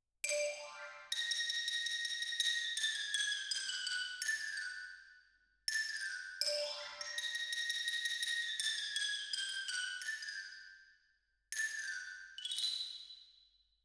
Twin R Xylo

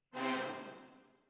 Hall

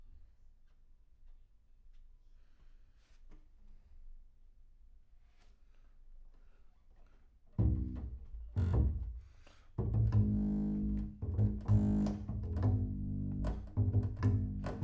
Bass Room

